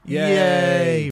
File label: yaaaay